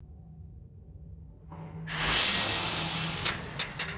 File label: pneumatic air pressure release and metallic rattle